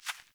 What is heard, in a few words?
Hare Footstep, Foley; Animals; Ambient